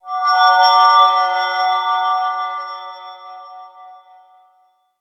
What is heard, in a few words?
Shadow Path Button Off; moul-assets